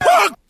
huh, Voice

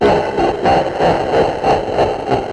laugh demon; ooh ahh

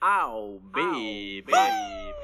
ow baby woo; ooh ahh; ow ouch

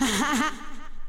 laugh

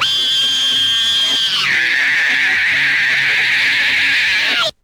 scream long

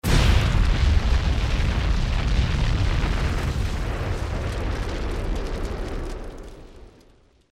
explosion; Crash Bang Boom

